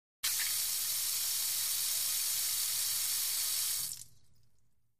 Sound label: Locker Room Sink Fau, sink